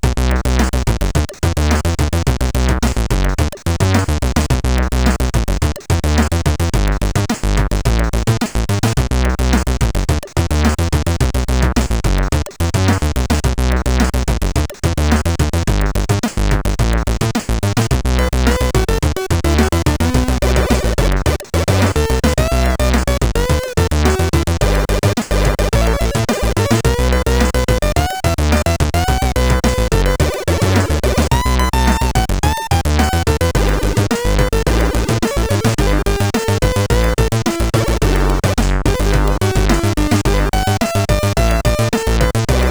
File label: Snare Note, sid; CSG8580R5; MCA